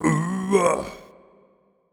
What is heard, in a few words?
blues king attack; Crypt of the NecroDancer